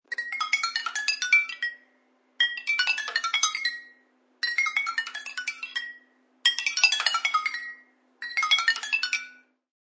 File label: Hitting glass rhythmically.; Hitting Glasses Rhythmically; Bottles; Musical